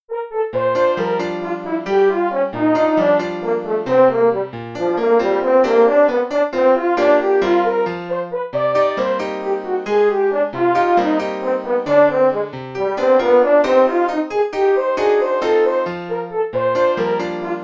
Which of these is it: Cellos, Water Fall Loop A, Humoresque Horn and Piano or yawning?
Humoresque Horn and Piano